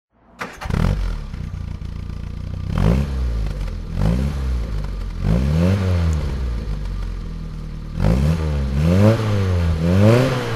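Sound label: triumph herald convertible throttle, engine sound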